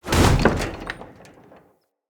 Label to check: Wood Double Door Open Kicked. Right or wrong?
Right